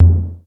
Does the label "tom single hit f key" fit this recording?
Yes